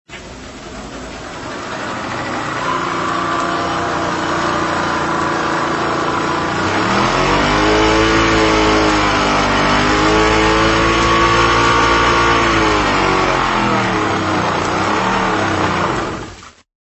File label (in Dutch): Solex op rollenbank geluid